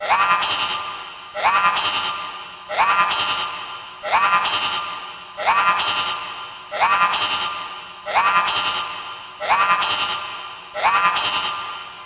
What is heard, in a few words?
Spaceship alarm